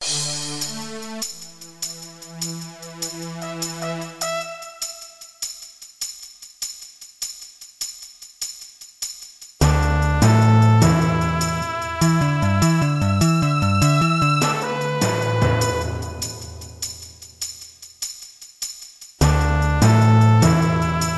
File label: Pirate